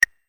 Button

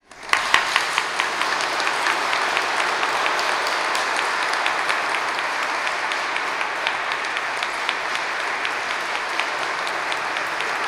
100 People Crowd Applause

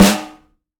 Snare

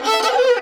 Violin Bit

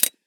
Switch Flip

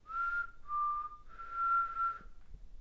Whistling

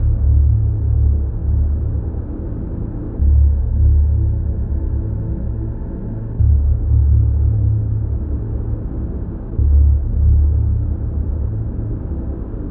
Void Loop

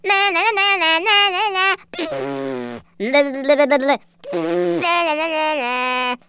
Cartoon Mocking Sounds

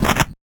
8bit Gun Reload

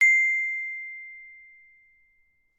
Xylophone C

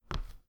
Hard Female Footstep

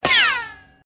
Cartoons Rico Bullet Impacts

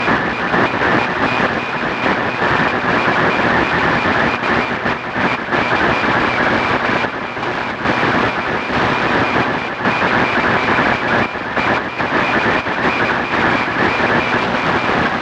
AM Radio Interference Industrial

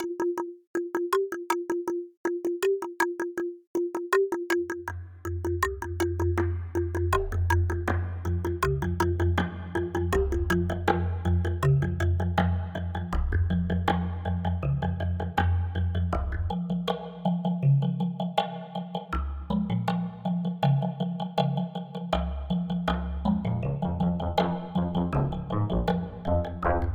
Slight Dramatic

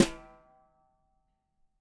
Brush Hit Mid

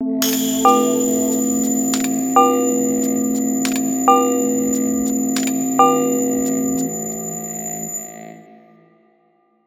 Strange Technic Logo Reveal

